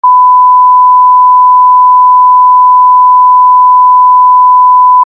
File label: 1000Hz